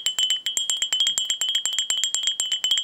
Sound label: bell